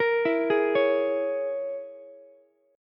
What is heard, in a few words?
A sharp Minor 7th Flat 5th A sharp, Ukulele; arpeggiated; 15,16,16,16